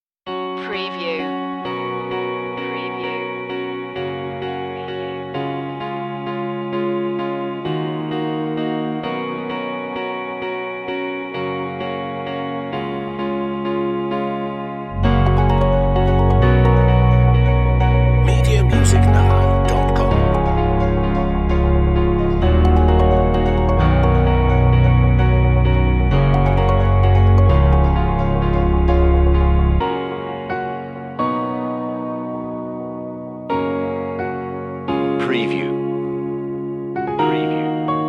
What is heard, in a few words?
no percussion